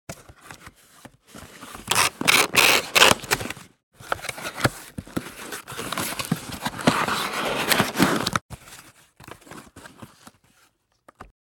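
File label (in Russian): box being unpacked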